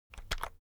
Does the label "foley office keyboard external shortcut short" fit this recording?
Yes